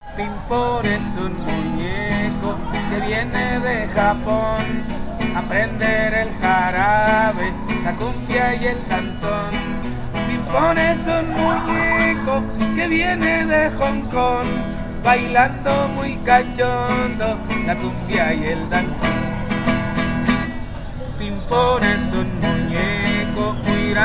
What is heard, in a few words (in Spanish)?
guitarrista